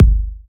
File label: kick drum sample g key, kick drum one shot tuned to the G note